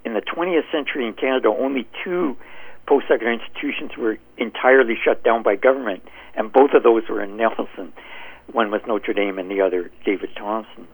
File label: Tom